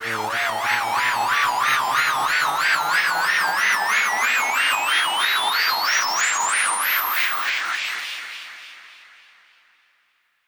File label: Force Riser